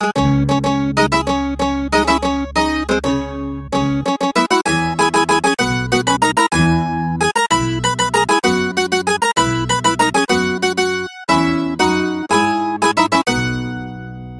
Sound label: Mandolin